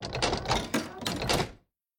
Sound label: initiate Chests; robin hood